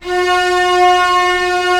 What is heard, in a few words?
STR C6 FF L, STRNGS, Orchestra; Sound On Sound - Peter Siedleczek's Orchestra